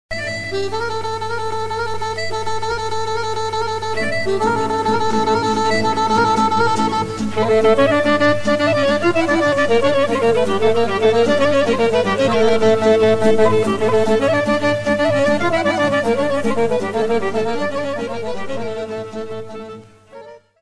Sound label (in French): Shopsko Horo - Bulgarie